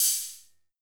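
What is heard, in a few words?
Hi-Hat Open, Luxor Passet; Drums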